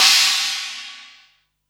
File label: CYMB, 180 BPM; Ueberschall; Drum & Bass Resonance